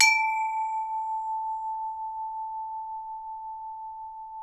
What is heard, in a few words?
D3 STUCK C, struck chmes; AKAI S6000 CD-ROM; WorldSounds